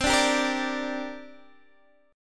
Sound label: C 7th Suspended 2nd, Guitar, Open D